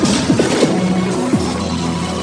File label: button